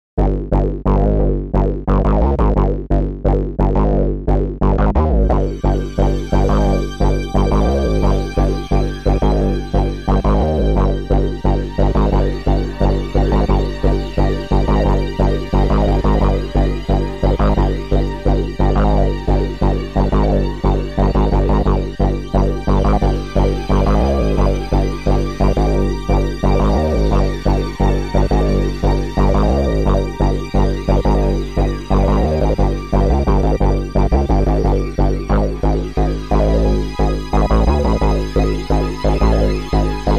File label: chasing bass synth